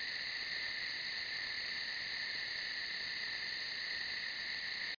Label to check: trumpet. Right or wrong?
Wrong